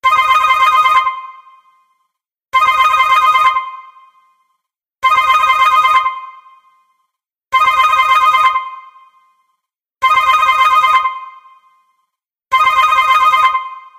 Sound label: Ring Synth